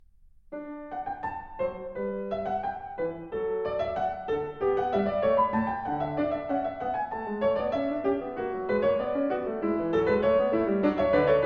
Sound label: Sinfonia in D-dur; Bach; BWV 789